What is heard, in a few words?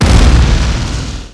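grenade; quake2; coop; weapons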